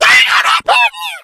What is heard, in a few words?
shoved, npc infected